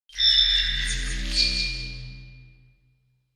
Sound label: computer feedback